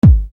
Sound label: Bass Dr, EuroDance Bdrums